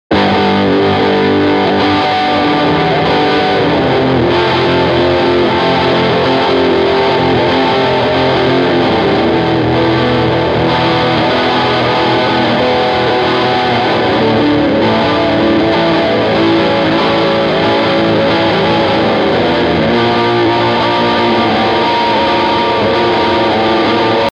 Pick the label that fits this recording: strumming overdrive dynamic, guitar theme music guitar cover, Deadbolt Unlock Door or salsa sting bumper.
strumming overdrive dynamic